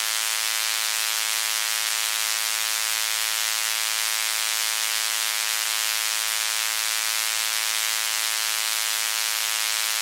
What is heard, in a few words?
fluorescent hum; Minetest; backrooms